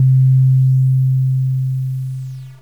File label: pruge bass; gae wild jam